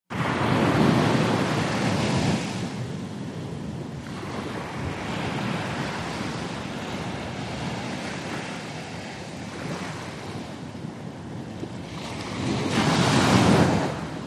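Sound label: Med Surf Caribbean Beach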